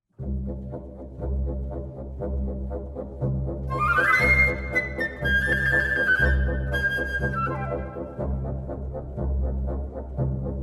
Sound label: piano